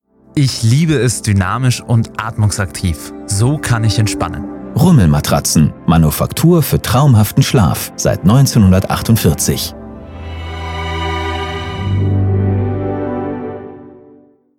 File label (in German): Radio Dynamik; Rummel Radio